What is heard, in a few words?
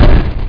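hit player, medusa; skrap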